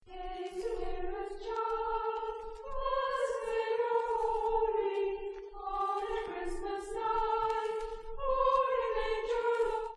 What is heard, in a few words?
Joyous Christmas Song; bay village